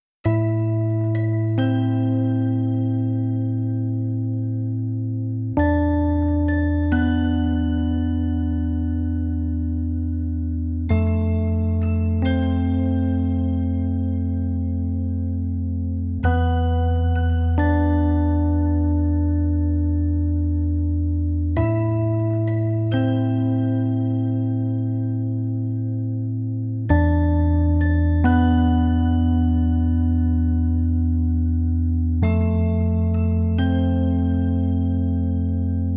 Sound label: toy piano; Muzyka Ilustracyjna